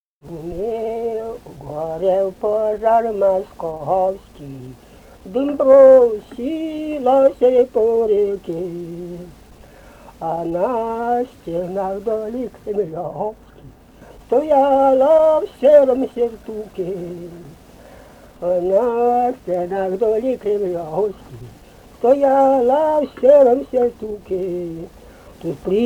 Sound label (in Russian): лирическая; Гремел, горел пожар московский